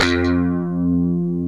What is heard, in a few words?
ROCK KIT